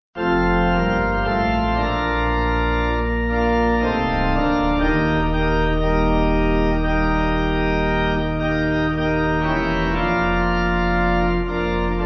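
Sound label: Pipe, Pipe Organ; O Lord My God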